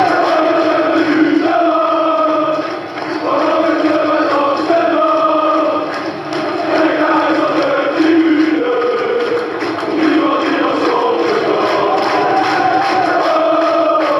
90 minutes long, fan chants

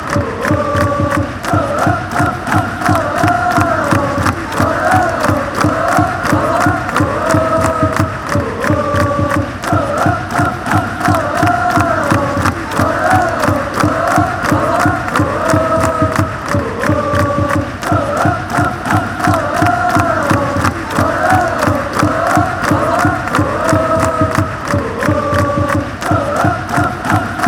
oh oh ohhhhhh, chants

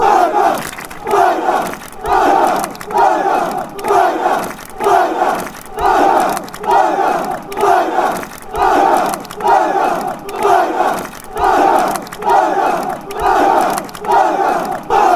parmap fanchants

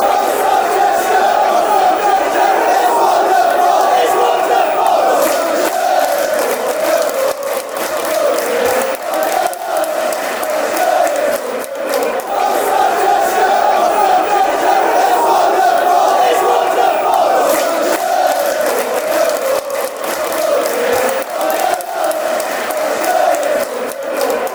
oh, fanchants; south cheshire

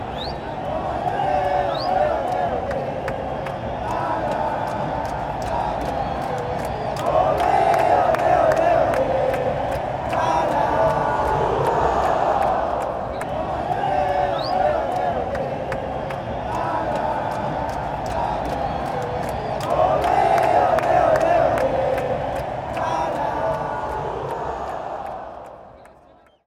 ole ole ole ole, fanchants

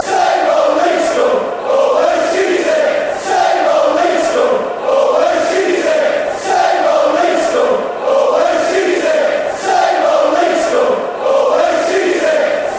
same old leeds scum, chants

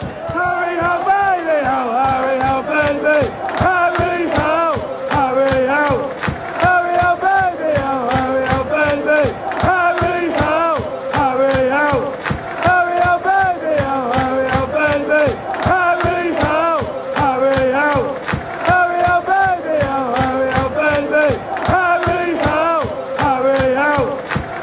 fanchants, Brighton football chants; harry howell

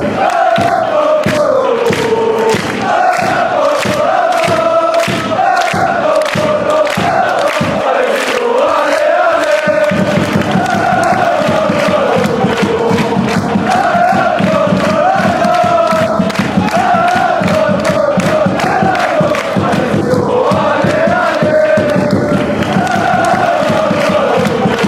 fan chants; lololololololo allez sion allez sion

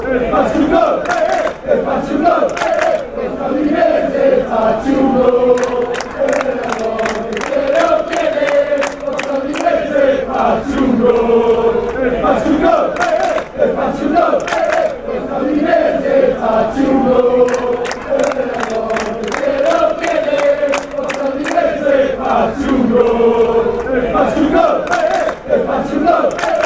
come score goal, football chants; udinese

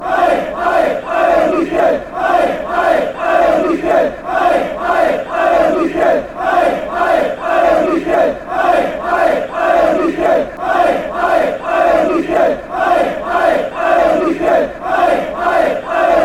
ale, fan chants; udinese

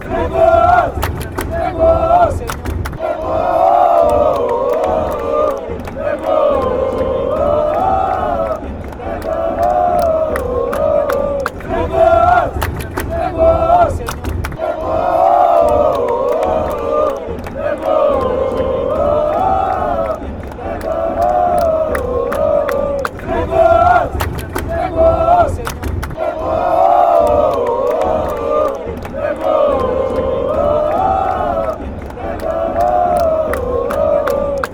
chant, fan chants; fluminense

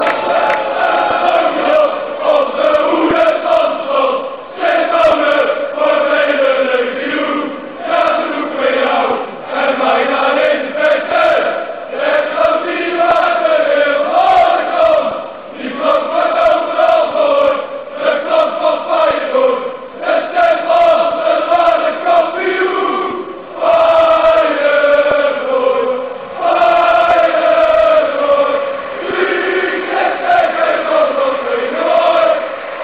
jalalalalala fan chants

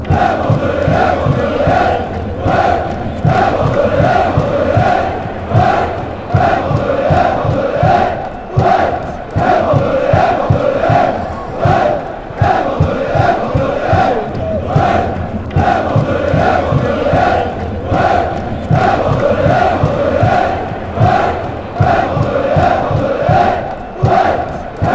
hey fan chants; bordelais

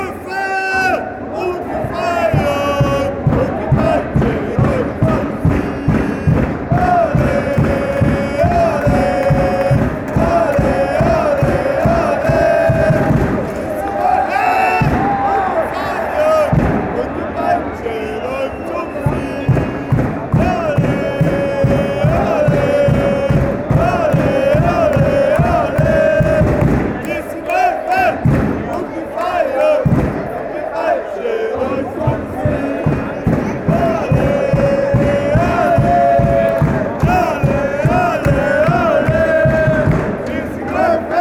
ole ole ole, fanchants

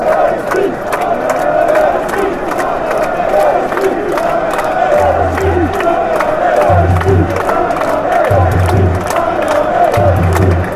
barmy army, fanchants; gary speeds

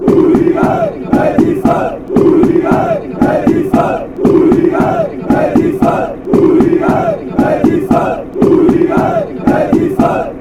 hooligans fan chants

